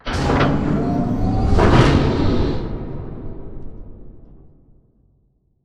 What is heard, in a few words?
Robot Arm Receiver Close; Boomtown; Titanfall 2